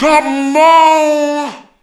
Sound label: COME VOX, Funky Vocals, SHOUTS; USB Soundscan vol.18